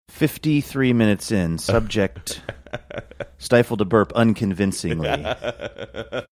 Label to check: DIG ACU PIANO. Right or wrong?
Wrong